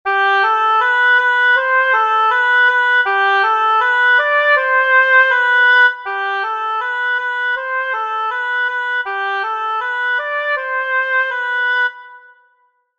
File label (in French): Gavotte phrase a seule deux fois